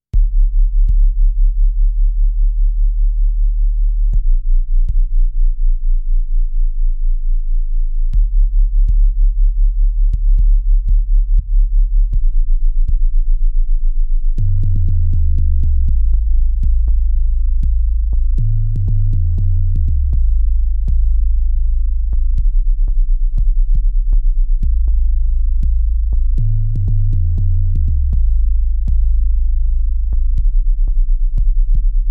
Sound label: Bass Outlaws Illegal bass